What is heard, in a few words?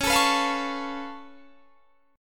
C sharp Augmented 7th, C sharp, down; C#+7; strummed